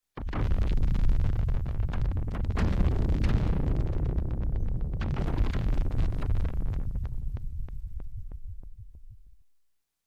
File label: Space Explosion, a Few Blasts, Electronic; Sound Ideas 2000 Series